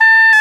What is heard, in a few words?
OBOE D'amore; Roland L-CDX-03 Disk 1; Oboe 4-8